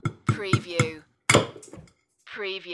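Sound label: Egg Open Knife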